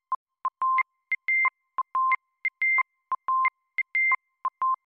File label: Two Tone Remix, alarm sounds; Zweiklang-Remix; Milestone 312